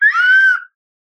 slide whistle scream; soundscrate

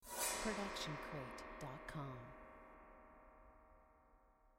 piano strum

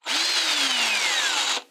drill revving

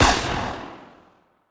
Sci-Fi Gun Shot; SoundsCrate

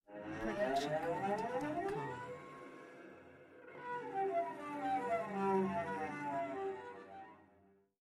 Cello Slide Up Down; SoundsCrate

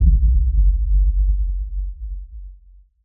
sub shockwave; soundscrate

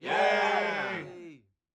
audience cheers variation

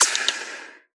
bullet impact ricochet

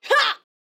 woman attack; soundscrate

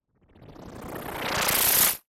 Laser Charge Up; SoundsCrate

